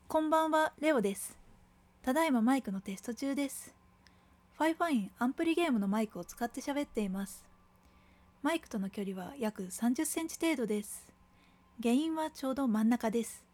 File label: amp